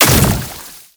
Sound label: Brood Projectile Impact; Warframe; Oraxia